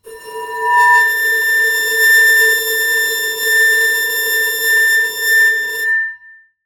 Bowed, Large Wine Glass

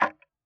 Tick, Clocks Kitchen Clock

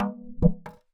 Polystyrene Rattle

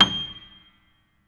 Note High, Piano; Piano Miscellaneous

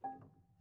Prepared Piano

